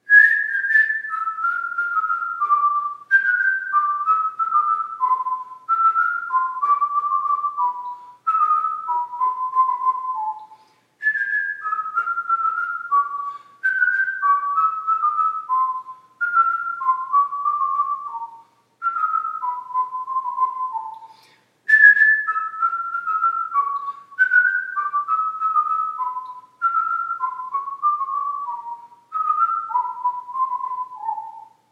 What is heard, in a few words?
whisting